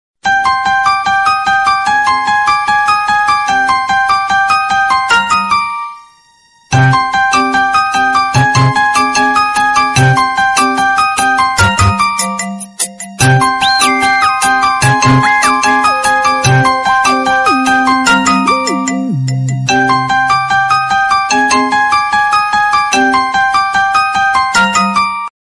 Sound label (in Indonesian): Nokia Jadul Instrumental Ringtone